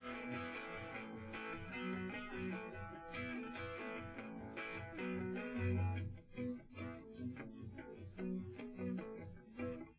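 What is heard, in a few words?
with drums live rec 5cm sim; SASSEC07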